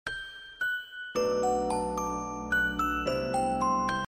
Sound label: lovely romantic trending sms tone ringtone